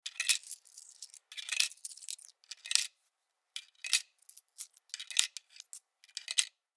Coin Dispenser; Coin Dispenser Insert Nickels; Money; Foley; Objects; Blastwave

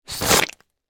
Suction Mud, Texture, Suction, Mud; Water Sound Effects; Water (Sound Effects:Nature); BlastWave

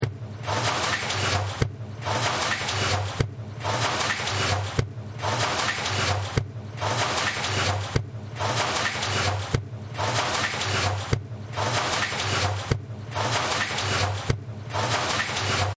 Factory; Factories; Backgrounds

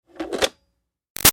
Switch Plastic Bubble Machine Crisp; Switches; Objects; BigRoomSound

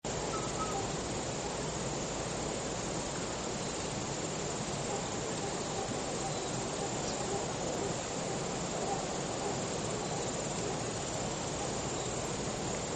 Morning Dog Birds River, Nature Scenes; Environmental

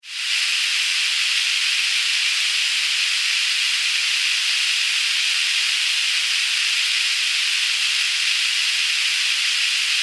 Science Fiction HUD Static Monitor; Communications

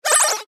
Beep Tone Signal Multimedia; Web Interactive; Blastwave; Technology

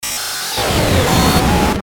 DIGITAL FEEDBA, Digital Feedback Noise; glitches; Sound Design; Blastwave

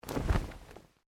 Clothing shirt cotton flap; Movemement sounds for various articles of clothing; Dressing; Human

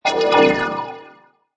Magical Warp Dark; Magical Warp Dark: Magic sound of casting spell, conjuring or wizardry. Witchcraft ritual summoning elemental or otherworldly creature.; Warped

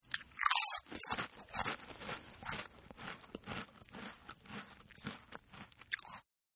Crunch n Chew, Eating; Human; Partners In Rhyme

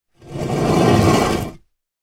Horror Coffin Lid Slide Rock Slate Movement; Stone Doors; Blastwave

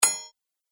Metal Blacksmith

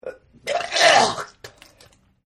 Vomit; Vomiting; Human; Partners In Rhyme

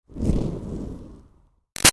Fire Torch Whoosh Slow Lite; Slow Moves; Flames

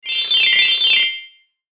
Magical Xylophone; Magic Sound Effects; fantasy sound effect

